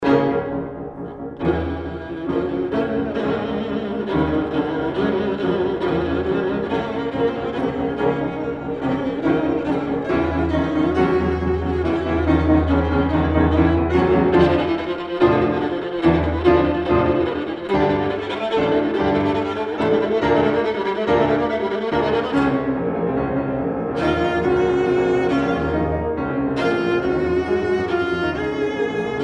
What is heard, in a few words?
Sonata for Viola and Piano; 2 Mvmt